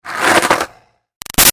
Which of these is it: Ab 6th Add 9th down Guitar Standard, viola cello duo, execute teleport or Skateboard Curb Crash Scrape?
Skateboard Curb Crash Scrape